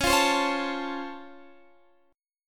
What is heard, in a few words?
C sharp Augmented Major 7th, C sharp, down, Guitar, Standard; strummed; C#+M7; JGuitar